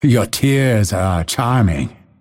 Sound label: deny pain; dota; phantom assassin